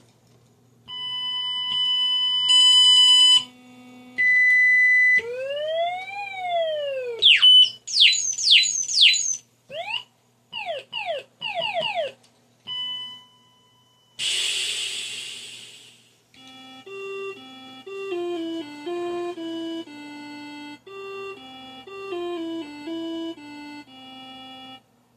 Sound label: musicbox mono